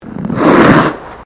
Ice skating Slide, Figure skating; Sports

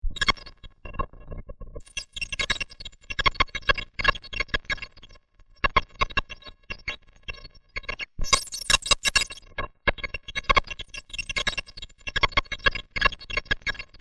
SciFi Spaceship Radio Bad Connection; Communication; Weird Alien Noises; Communications

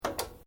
Switch Washing Machine Flip Double Click; Various switches; Appliances; Household

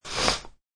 One sniff, One sniff or sniffle; Sniffling; Human

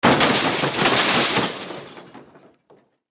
Impact Crash Metal Glass Rock; Materials

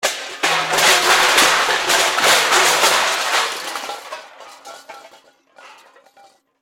Impact Metal Crash; Crashes; Objects; BlastWave

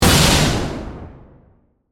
Force Field Hit; Science, Fiction

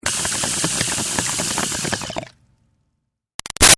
Bong Water Pin In Inhale Bubbles Med Heavy; Nature; Big Room Sound

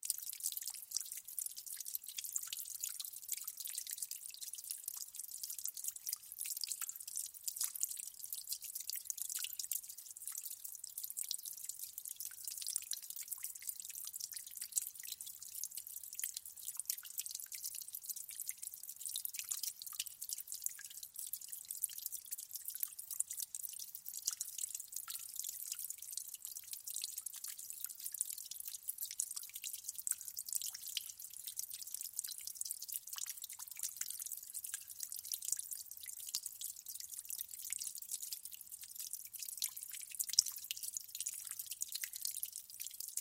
Water Drips Splat Loop; Dripping; Objects; Blastwave

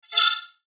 FLASH ELEMENT HIGH TECH

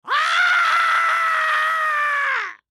Human Scream; Scream Long High Pitch Male Old; Screaming; Blastwave

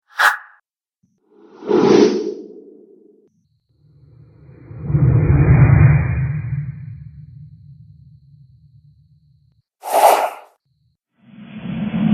Strong Wind Whooshes; Whoosh Sound Effects

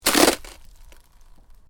Ice: short crack of ice under pressure; Snow; Weather; Stuart Duffield

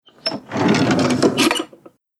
Old Wooden Drawer Open; Andrew Fleming

